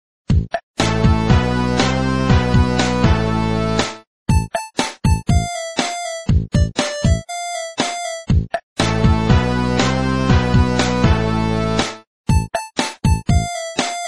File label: easy sexy instrumental song tone